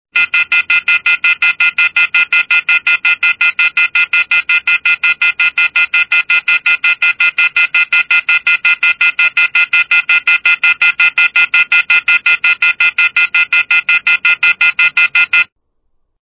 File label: Phone Busy